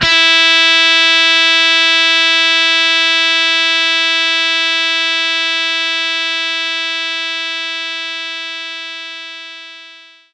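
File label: Distortion Guitar E2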